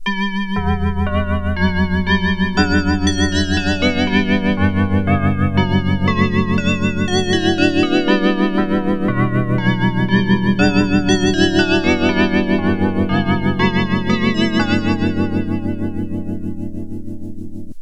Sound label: dx7 bells devtronix